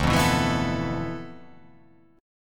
D Minor 6th Add 9th D

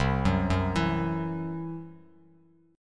C-Major Flat 5th, Bass Standard; arpeggiated

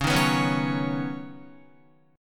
Db 7th Suspended 2nd Suspended 4th, Guitar; Db7sus2sus4 strummed

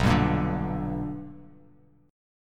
B-Minor Major 7th Sharp 5th B down; BmM7#5 strummed; JGuitar

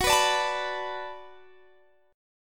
B Minor 7th F sharp, chord

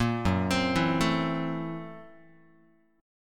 F sharp 7th Sharp 9th Flat 5th Guitar, chord; 4A,4E,5A,5D,5Gsharp,6Csharp; arpeggiated; 1,2,3,2,1,x; JGuitar

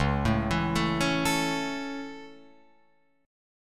C sharp Minor Sharp 5th down Guitar Open F; C#m#5; arpeggiated; JGuitar

